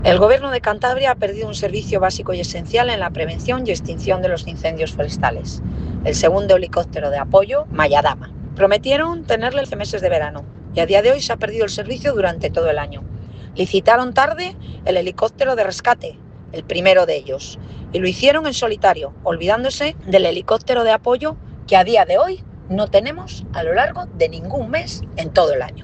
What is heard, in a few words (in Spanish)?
Helicoptero incendios; coptero complementario que el gobierno prometio mantener todo el anyo